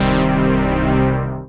bold cinematic synth brass stab punchy and bright

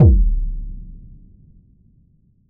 dark cinematic impact

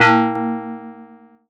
metallic synthetic brass stab

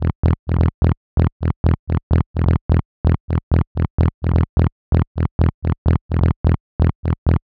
electro house distorted bass 128bpm

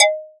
metallic tech house percussion

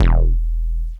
gritty industrial techno bass stab

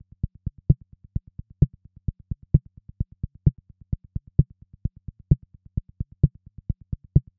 vintage 303 acid bassline 130bpm

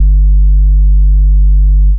warm analog sub bass in g; 2 seconds long

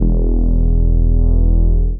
thick distorted jungle bass one shot in e

